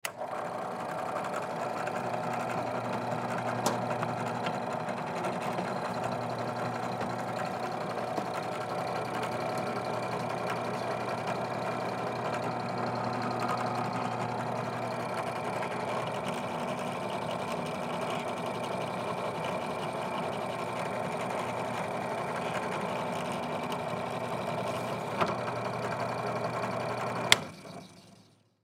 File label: Drill Press On Run Off; Drills; Tools; Industry; Blastwave